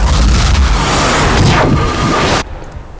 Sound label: gate closing